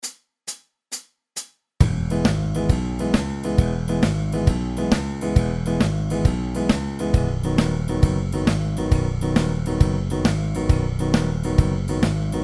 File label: banjo; xmas